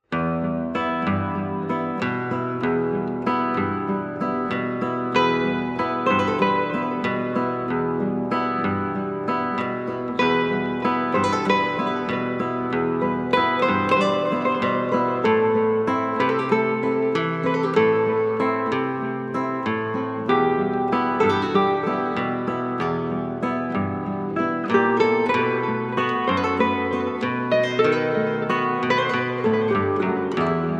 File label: Amazing Chill Guitar; CHILL OUT SUAVE